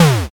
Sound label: hit